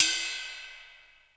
RD, Casio CTK-5000

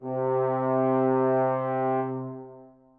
trombone bass leg C3, Brass; BBC

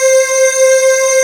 C4, SYNTH; MOONY; Q Up Arts; Dream Experience

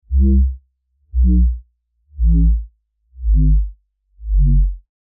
reason bass; neuro; Sinistarr

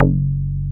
REZO BASS; Masterbits; Dance Trax

